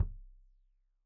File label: Kik Stereo; Deeply Sampled Drums; SMDrum